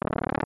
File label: scroll; smw